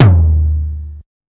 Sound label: Tom, dx